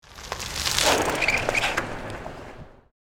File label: Bicycle bike stopping and braking with a squeak